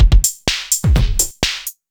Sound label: Beat, Drums & Percussion; Electrocution; Beats